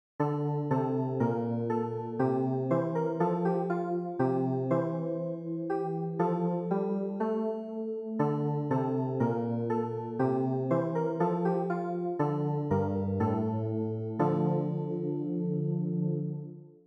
CLAVIER

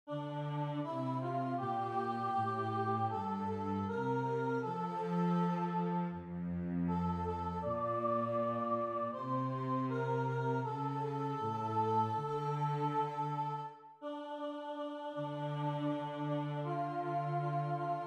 VIOLONCELLE; Psaume129

